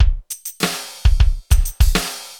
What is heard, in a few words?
FILLS, ROCK POP DRUM; MAGIX